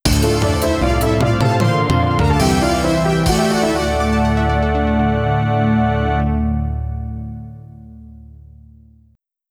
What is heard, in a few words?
Stage Complete [Roland LA]